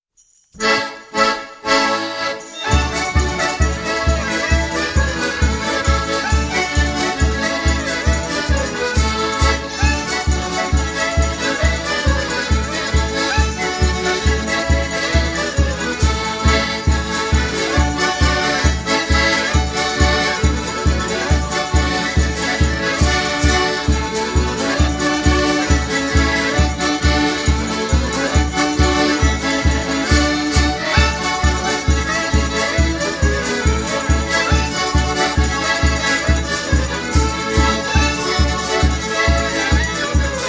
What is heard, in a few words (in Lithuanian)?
kaimo kapela Polka